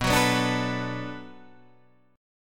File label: B Minor 7th B, chord; Bm7 strummed